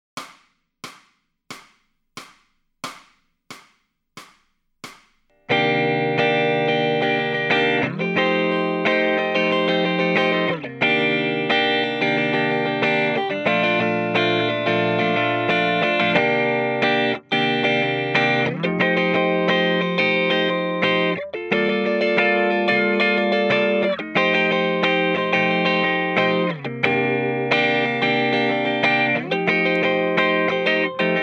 Lead Backing Track, electric guitar

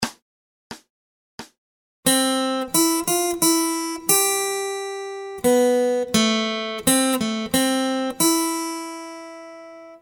Acoustic Accompaniment, acoustic guitar

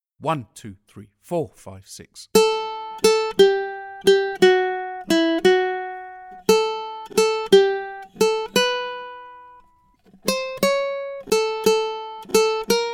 Accompaniment, ukulele; grade3

